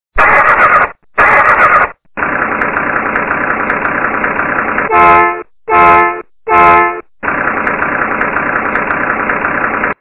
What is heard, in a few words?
Diesel Engine